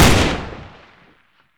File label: de fire, pistol deserteagle; garrysmod